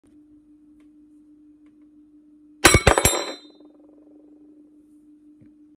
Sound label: utensil dropping